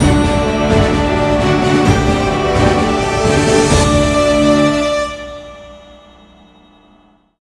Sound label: ui quest clear